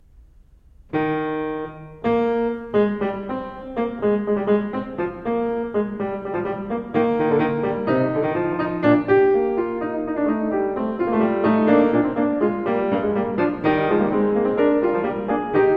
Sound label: Prelude And Fugue No 7 In E-Flat Major BWV 876 Fuga, Clavier; Johann Sebastian Bach; Well-Tempered Clavier